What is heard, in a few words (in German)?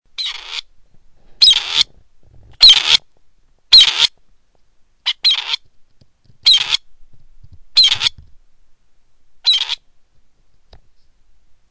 rebhahn